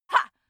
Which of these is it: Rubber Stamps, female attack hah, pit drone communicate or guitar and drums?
female attack hah